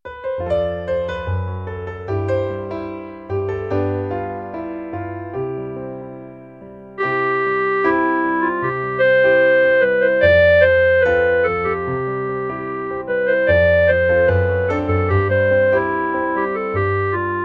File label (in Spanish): CLARINETE; musica I ESO 2015